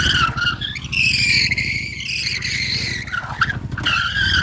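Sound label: SKID YBR, motorcycle; YBR125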